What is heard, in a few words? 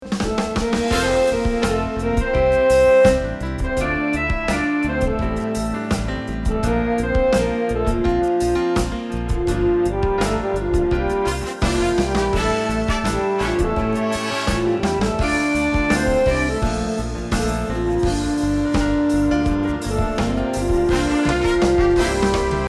short version music